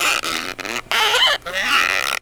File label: Foley